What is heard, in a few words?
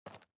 SFX run gravel